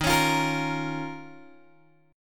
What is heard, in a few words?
Eb 7th Suspended 2nd Eb, Guitar Open G; strummed; chord